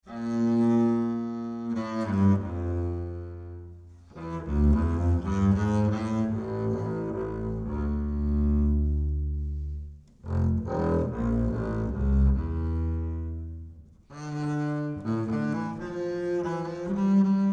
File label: Ac Bass Bowed; 50cm C